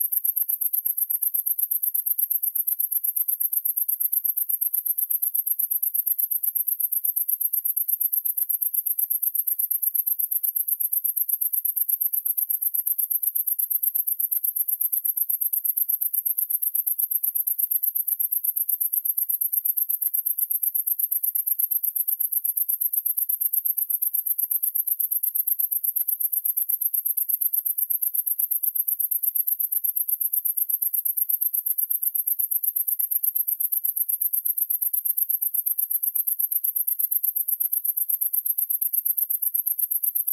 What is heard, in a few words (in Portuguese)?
Sons de Insetos